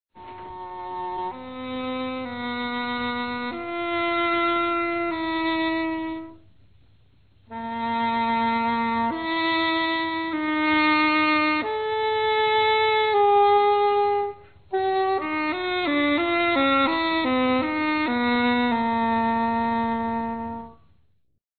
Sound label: Eerie Flute